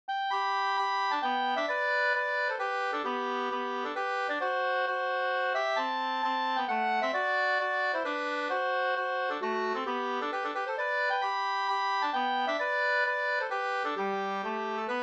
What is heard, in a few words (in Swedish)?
hambopolska, 2st